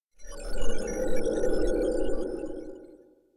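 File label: electronic inside kigurumi; awayuki; anemoi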